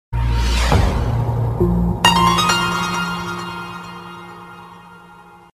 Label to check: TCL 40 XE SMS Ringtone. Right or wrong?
Right